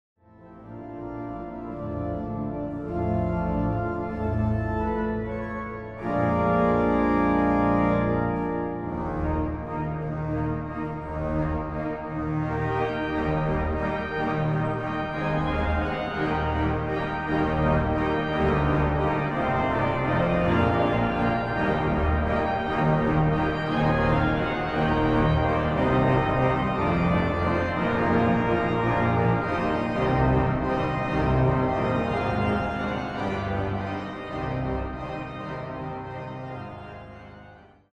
improvisatie over het flakkees volkslied; Martin Mans Bavo

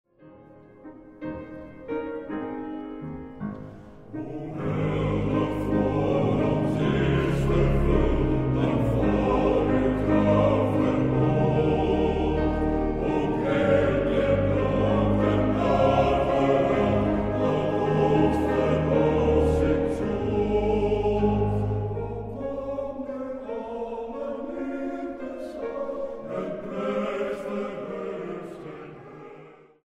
wiegelied

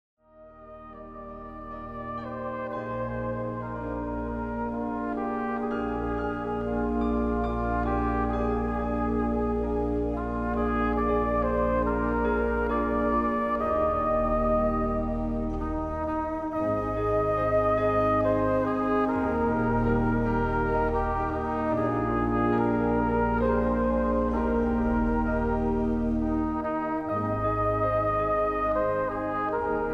wiegenlied

